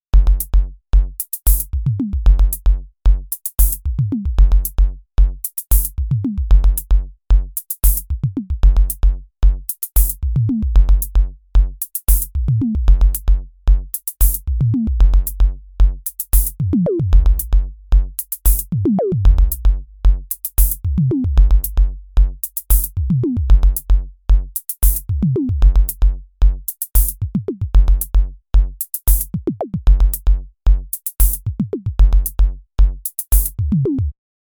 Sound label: Audiothingies DoubleDrummer Tom Tweaking